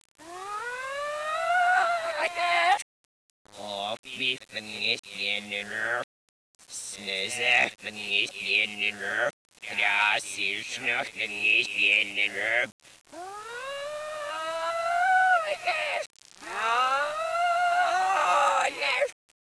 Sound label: echo backwards